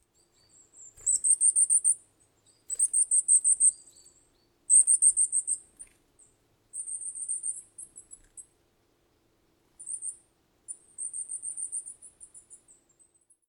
Goldcrest Regulus regulus

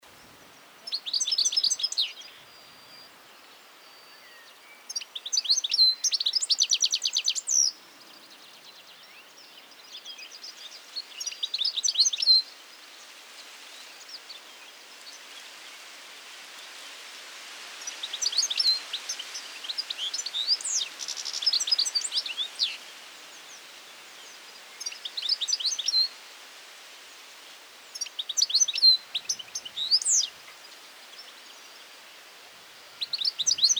European Goldfinch Carduelis carduelis

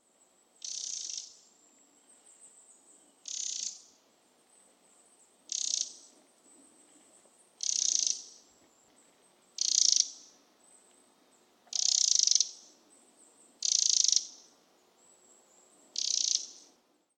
Eurasian Wren Troglodytes troglodytes

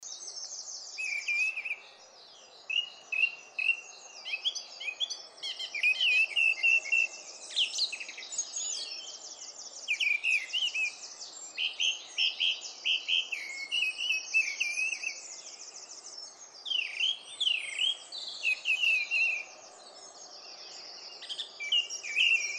Mistle Thrush Turdus viscivorus viscivorus

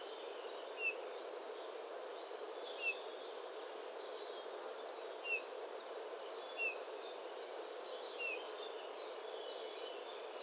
Eurasian Bullfinch Pyrrhula pyrrhula

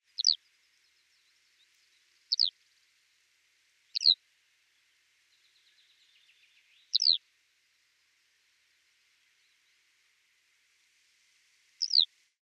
Eurasian Skylark Alauda arvensis

